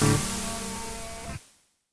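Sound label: Noise; 300 Drum Machines; Yamaha DTXpress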